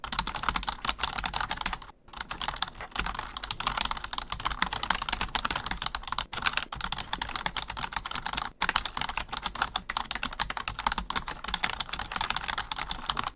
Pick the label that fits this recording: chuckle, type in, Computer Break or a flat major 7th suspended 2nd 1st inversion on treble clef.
type in